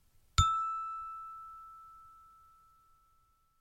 zvonok

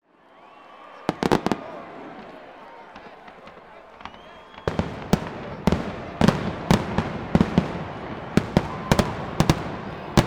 fejerverk na stadione; zvukovie effekti

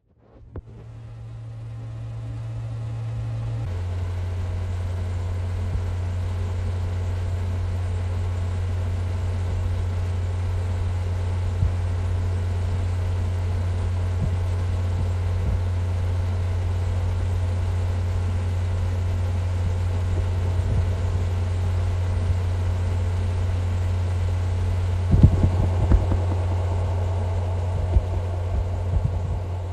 belij shum strannaya atmosfera

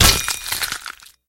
zvon nozha pronikshego i provernutogo vnutri predmeta

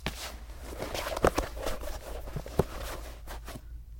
ochishenie obuvi kremom

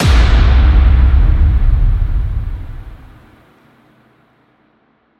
nachalo i pauza s zatuhaniem

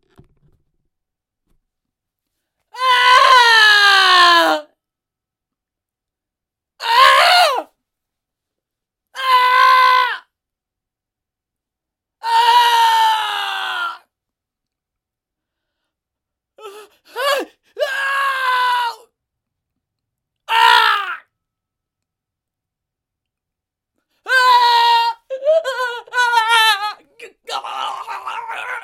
chelovek krichit

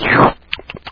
hlebanie vsasivanie s chavkanem

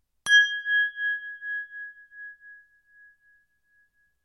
zvonok vibriruyushij spokojnij

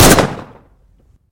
gromkij pistoletnij vistrel

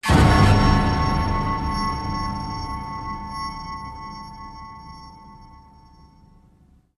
zvukovoj effekt preduprezhdenie ob opasnosti

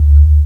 knopka cifrovoi odinochnii dalekii priglushennii

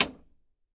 korotkij shelchok viklyucheniya sveta

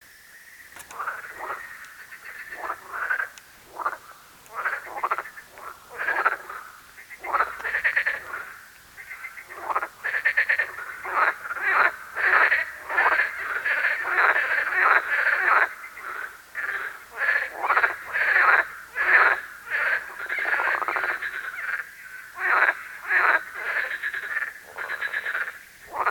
lyagushki na fone cikad

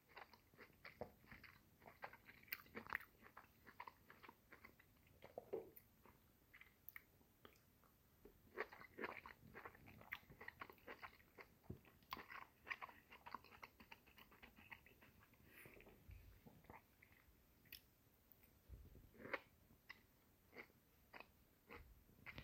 est apelsin

